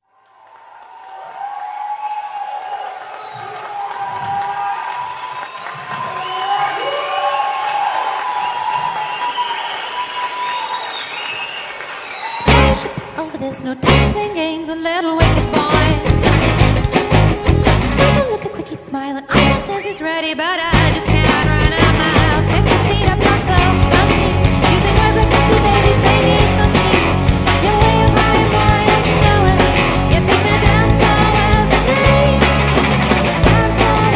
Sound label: Funky